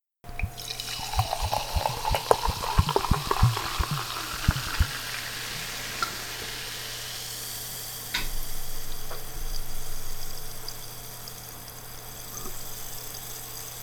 Pour In Carbonated Drink

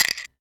Chain

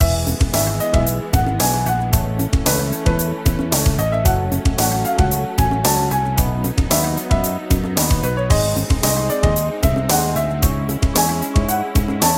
Platform Game Theme Loop

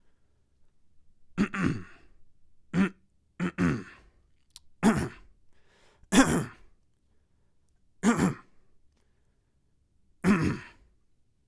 Male Throat Clearing Various

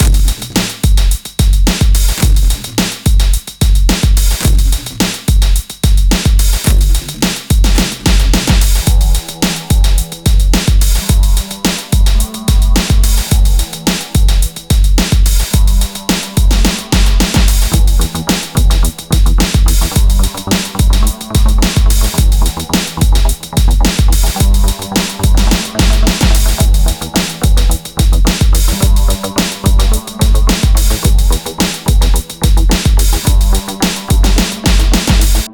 Break Remix

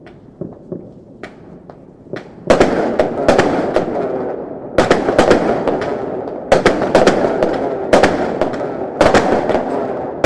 Chinese Firecrackers

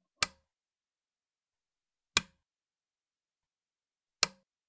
Bedside Lamp Switch

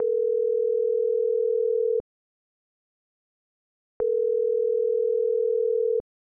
Ring Back Tone

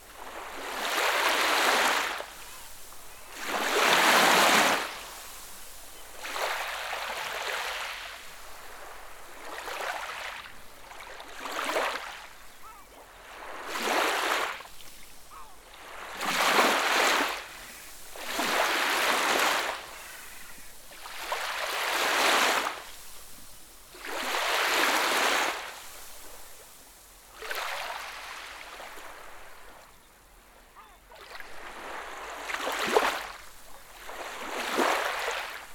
Waves On A Sandy Beach

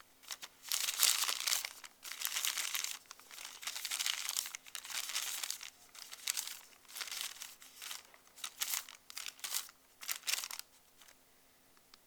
Paper Rustle

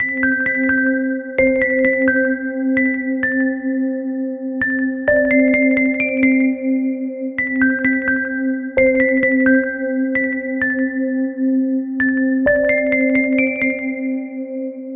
Piano Happy Solo Sunset Loop

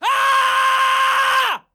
Boy Scream

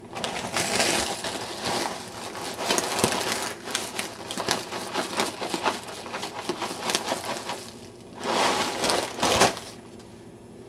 Shuffling Cereal Bag

